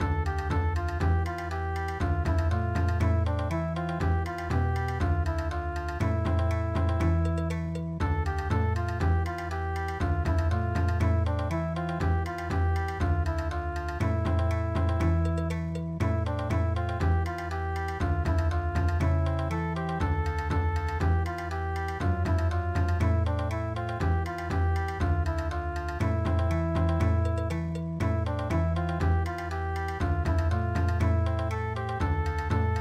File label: dance Bon Odori